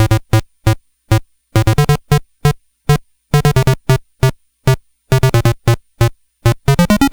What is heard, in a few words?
Game Boy Bass; Nintendo Game Boy